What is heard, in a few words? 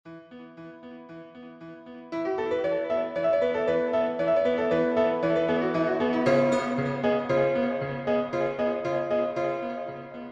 Microtonal Miniature in E